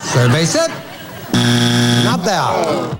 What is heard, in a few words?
survey wrong; feud